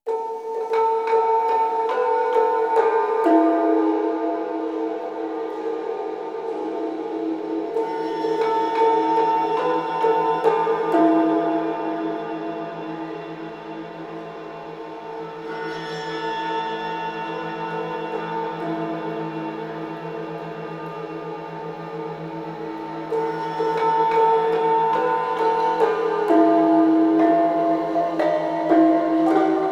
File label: Gamelan; Mask Shrine; Kena Bridge of Spirits